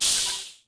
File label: remote; interface